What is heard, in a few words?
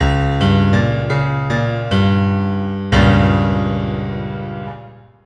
gameover